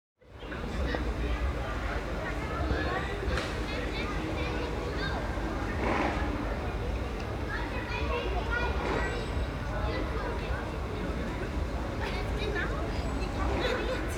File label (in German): Froehlicher Innenhof, From a distance, the constant hum of a ventilation system can be heard, occasionally complemented by the call of a falcon; Soundwalk; A Soundwalk for Klangspuren Festival 2025